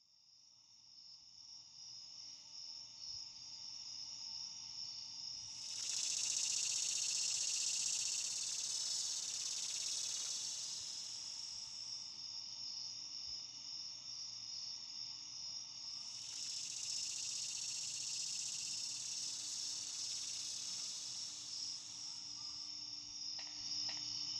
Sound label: Cicada Drift